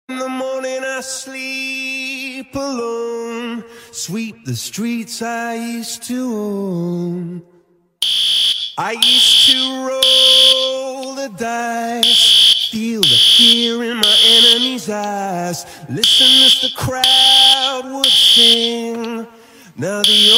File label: fire alarm